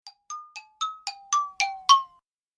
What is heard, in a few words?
Time Passing, doppler shifted ringtones iphone